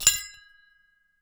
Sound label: Hole Saw Rattle, Hole Saw Bits